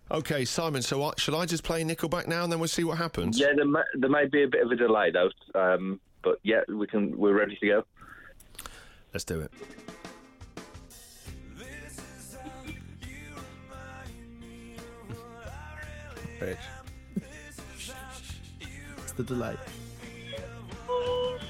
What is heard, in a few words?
the singing dog; louie